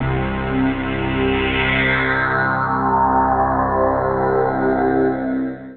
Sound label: PAD